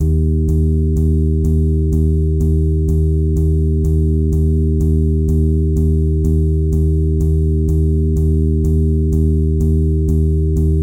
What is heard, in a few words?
Zen Waves Steady on 1 in Eb; Mike Cirillo; Musical Self-Actualization